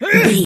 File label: voice female attack